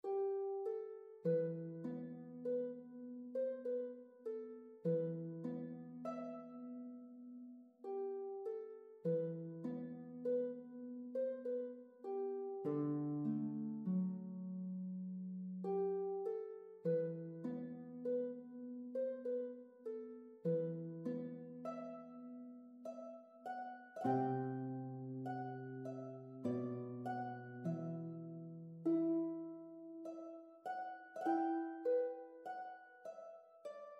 Harp Arrangement

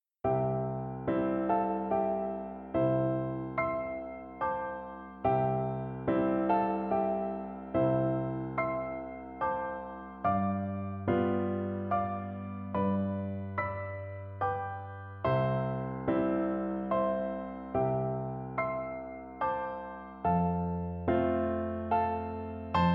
Piano

